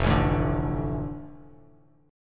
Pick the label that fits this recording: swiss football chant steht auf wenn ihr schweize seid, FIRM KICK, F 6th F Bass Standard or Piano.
F 6th F Bass Standard